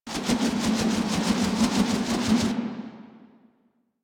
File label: Fall Wind Whoosh; Foley; Warframe; Tenno